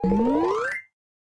Quest Charge

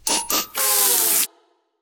SFX Battle Cry; SFXBattleCry1

